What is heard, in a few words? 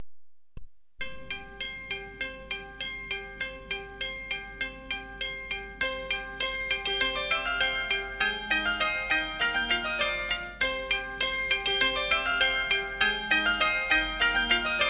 Bit Sms